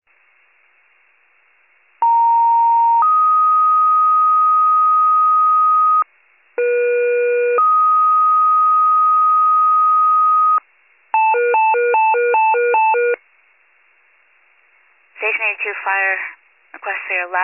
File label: FIRE pager alert